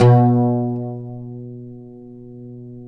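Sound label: pluck OUD B; Roland - LA Composer vol. 3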